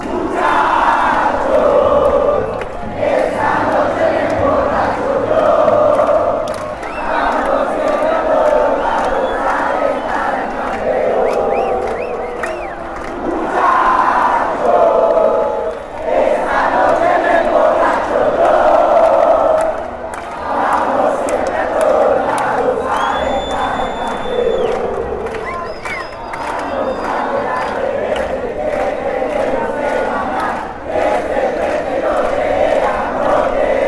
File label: deportivo cali chant